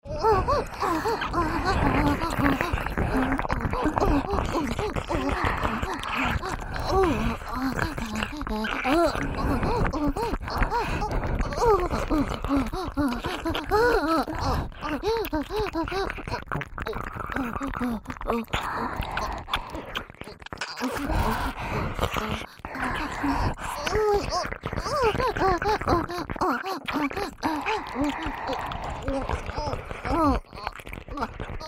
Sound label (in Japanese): オホ喘ぎ SE無 ＋両耳れろれろ舐め; おまけループ（オナサポ）; 3WAYループ